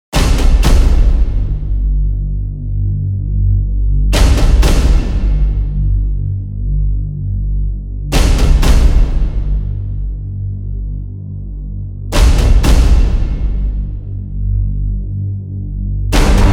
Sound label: Epic Action & Adventure